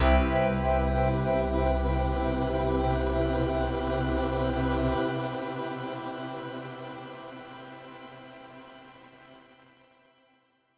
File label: Intro Drone